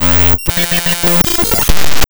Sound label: glitch sound